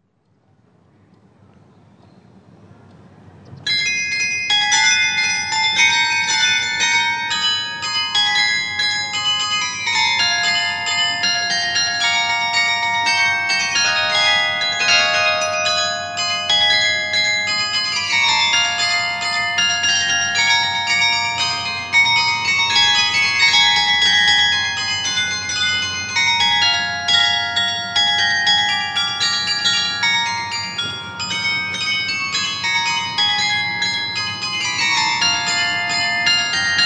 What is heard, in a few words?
carillon